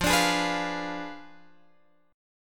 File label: F Diminished 7th F down Guitar Open F; strummed